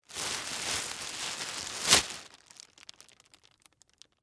Household, Garbage, Bag, Shake, Open; BlastWave

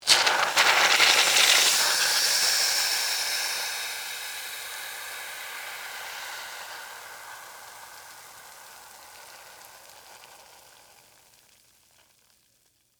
Acid Burn Sizzle, Scifi, Acid, Burn, Sizzle, Fizz; Tech; BlastWave

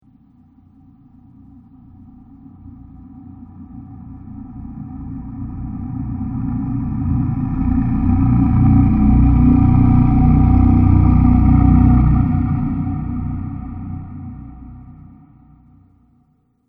Ghost Of The Cosmos; horror; Ghost Of The Cosmos 01; Ghost Sound Effects

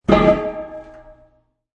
Keg Bang; Bee keg bangs; Kegs; Bars

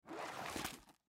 Textbook Slide, Textbook Slide Pick Up Cement; Offices; Foley; Blastwave

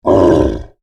Monster Growl, Horror, Monster, Growl, Creature; Monsters (Sound Effects:Horror); BlastWave

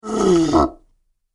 Creature Tired Groan; Monster Sound Effects; Andrew Fleming

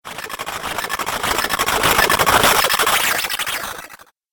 Space News Just In, sci fi sound effect; Science Fiction Sound Effect

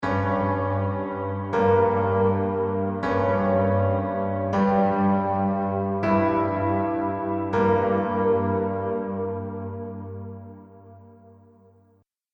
Zombie Theme Short Interlude 80Bpm, Horror; Despicable Sound Effect To Send Shivers Down Your Spine!

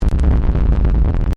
Distortion Thick; Sound Design; Distortion Sound Effects

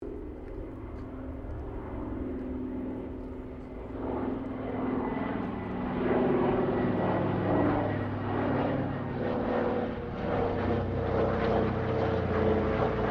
AIRCRAFT DOUGLAS DC3 PASS DISTANT; Airplanes; Vehicles; Qantas 1942 DC3

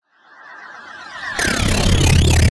Sweeper Chopper Distortion; Chopper Sound Effects; Production Element Imaging Element Accent Transition

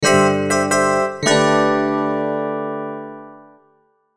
Logo Piano Bells; Sonic Logo Sound Effects; Production Element Imaging Element Accent Transition

